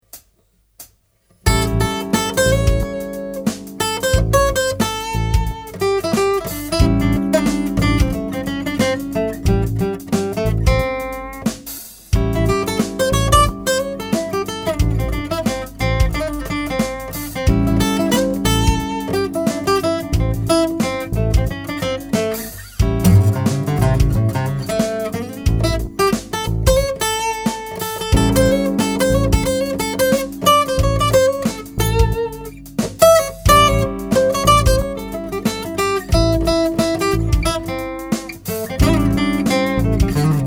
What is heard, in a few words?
3rd solo